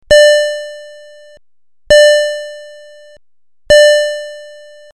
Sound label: ding slow